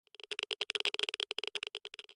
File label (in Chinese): 玄武岩三角洲咔嗒声; ambient